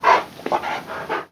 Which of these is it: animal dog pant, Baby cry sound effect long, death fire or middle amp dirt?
animal dog pant